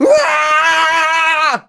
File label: Aaa Scream; Kite